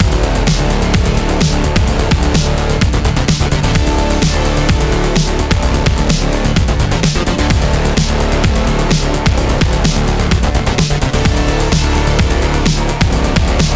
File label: Duel Father Attaque Speed 128 bpm Solo